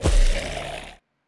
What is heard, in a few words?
Enemy Woodsman Walk